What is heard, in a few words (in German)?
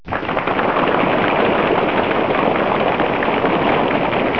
Applaus